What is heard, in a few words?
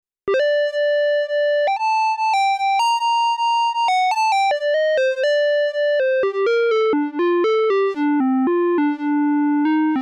Triangle Lead 4075